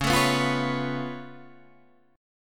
C sharp Augmented 7th C sharp down Guitar Half Step Down, chord; C#+7